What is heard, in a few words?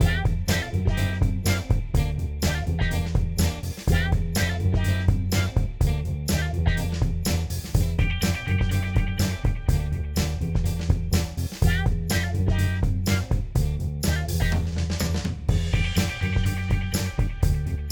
Groove